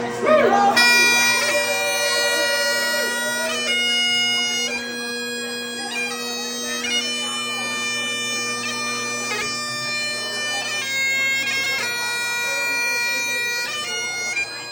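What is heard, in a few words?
piper, Traditional Scottish Bagpiper; Scotland the Brave / Mharis Wedding; Glasgow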